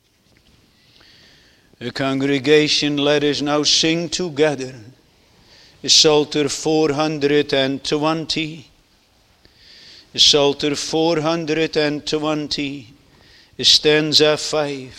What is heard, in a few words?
Singing; isaiah 25 9